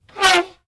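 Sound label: Elephant Single Short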